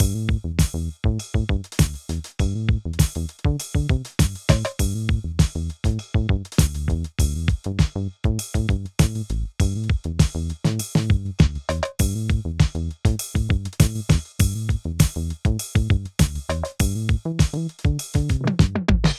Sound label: 4 4 100bpm Streets Funky Loop